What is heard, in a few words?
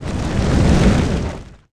Action Large